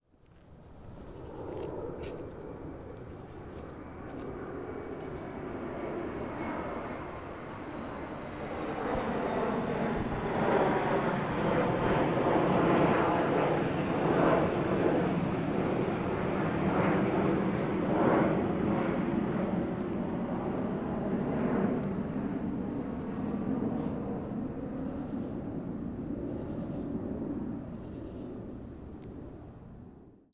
jet passing

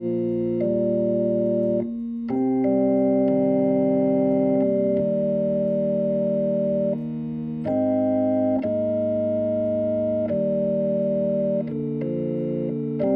Hammond Mic Hi

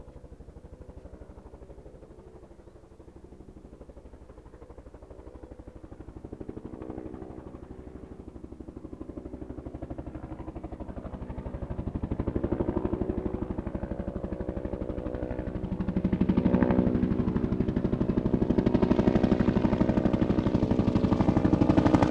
Helicopter

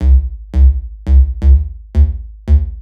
808 Loop BD 85, Roland 808 Drum Loops, 85bpm

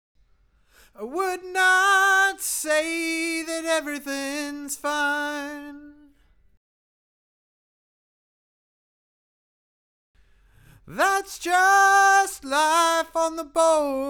Backing Vox

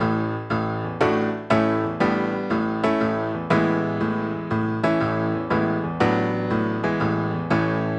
Pop Piano

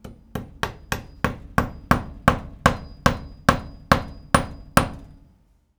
hammer Nail; tools Bangs

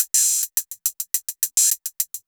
808 Loop Hats 105, Roland 808 Drum Loops, 105bpm

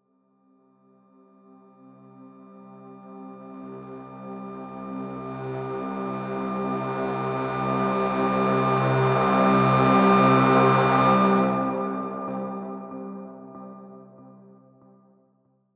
Crisp C Synth SFX; Aerosol

